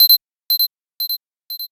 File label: NFC Transfer Initiated; Nothing Phone (1)